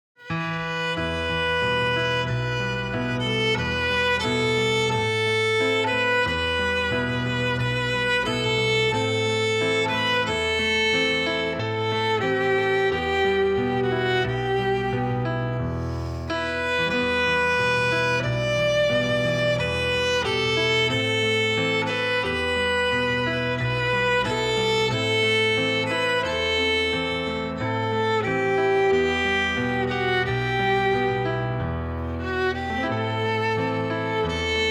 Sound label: Violin; Komitas